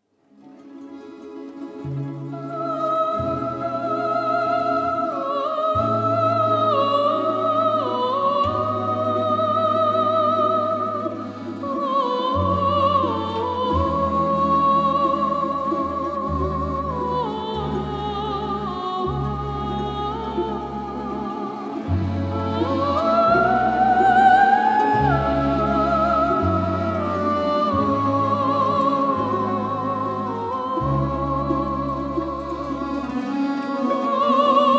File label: Vocalise